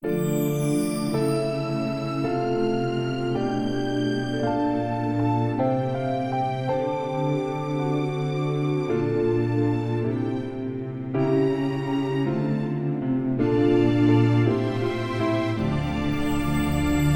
Sleepy Song

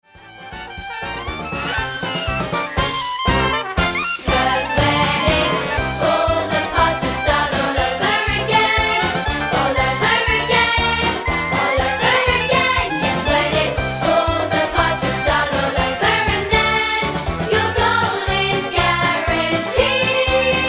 (Vox)

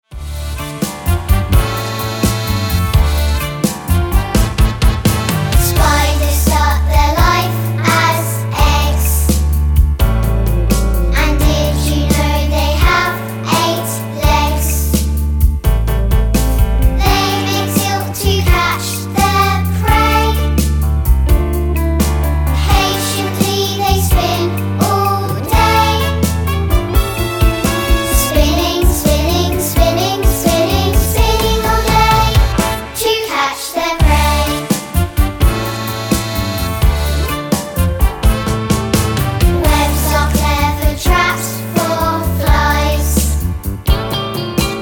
Spider Song

